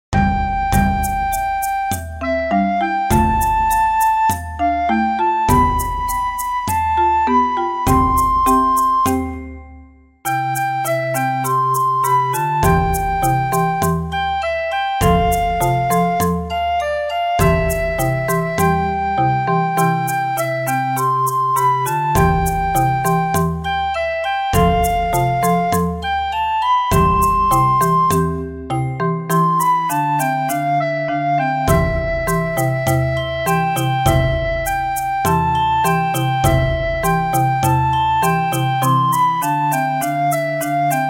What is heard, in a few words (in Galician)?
Entroido, conxunto instrumental